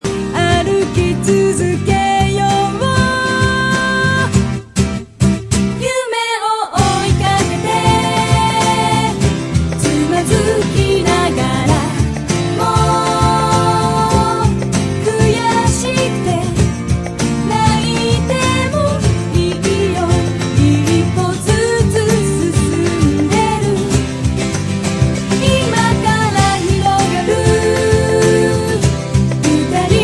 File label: melody